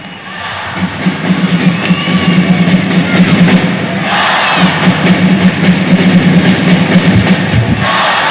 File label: storm